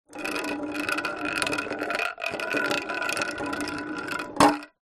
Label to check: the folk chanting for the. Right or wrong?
Wrong